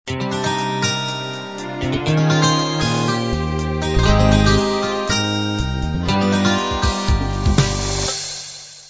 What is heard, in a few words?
uk rb intro